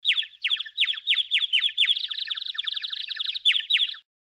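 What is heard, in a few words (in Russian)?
птички поют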